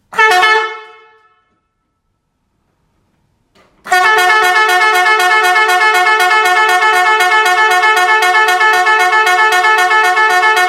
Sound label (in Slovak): klakson 12v podtlakova fanfara so stalym tonom